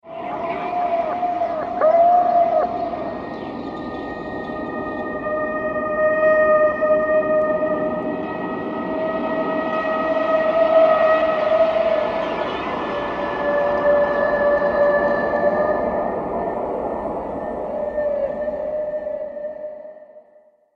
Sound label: Screams Horror Atmosphere, scary soundscape